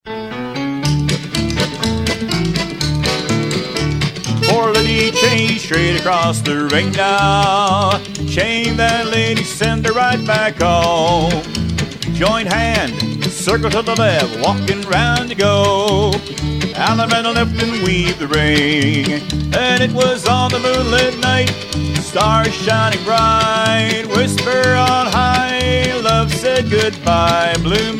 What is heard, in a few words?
vocal